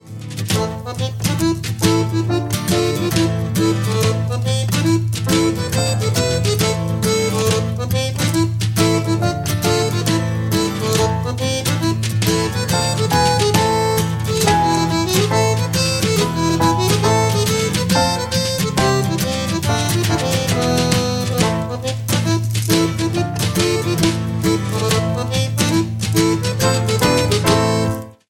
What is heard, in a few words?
Instrumental; Charlie, Hes My Darling